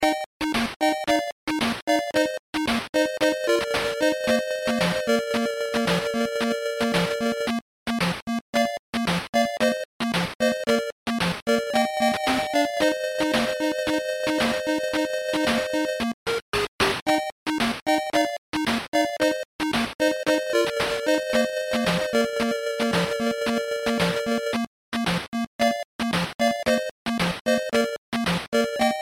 Underwater

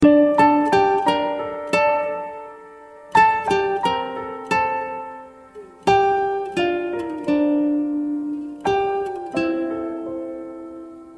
Beautiful Sms Melody; meloboom

